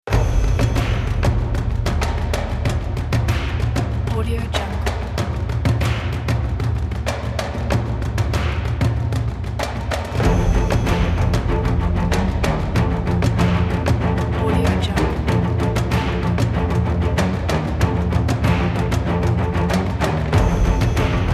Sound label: loading theme